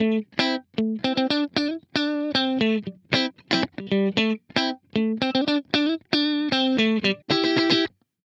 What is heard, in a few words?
115 Am Funky Strat Guitar